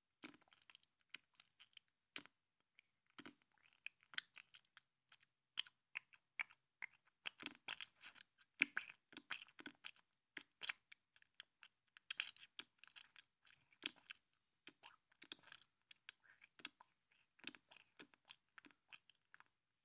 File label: Through Slime